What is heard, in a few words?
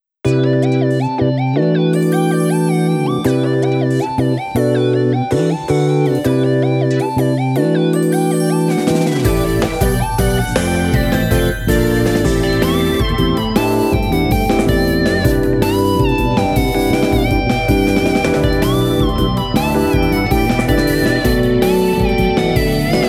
Pop Drum 'n' Bass; Atelier Ryza: Ever Darkness & the Secret Hideout